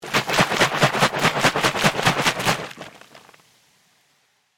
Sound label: Soda Bottle Shake; Foley; Shaking; Objects; BlastWave